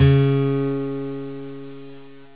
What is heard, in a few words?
beep; xfce